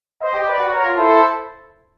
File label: Cartoon Failure Horn; Cartoon Music